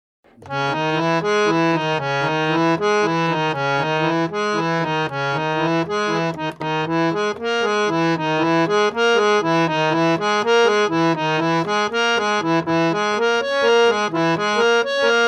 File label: harmonium; pompons